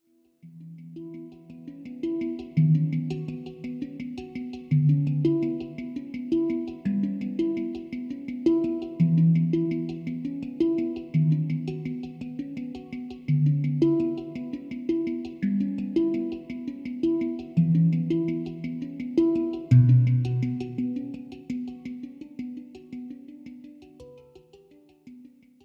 Hang

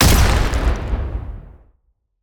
Tentacle Smash Hit Resistance; Illaoi

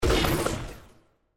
Rock W; Qiyana

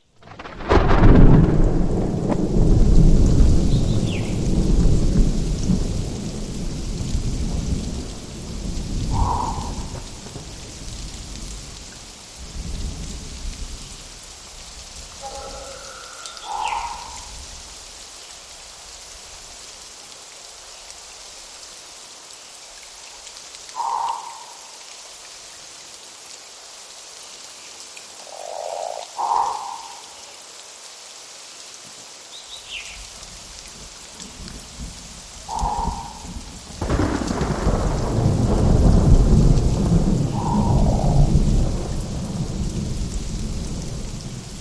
Ocean Amb

